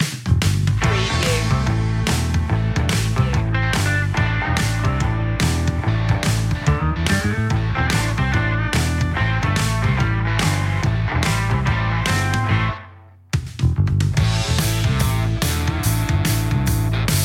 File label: rusty gritty southern rock